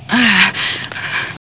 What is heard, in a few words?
pain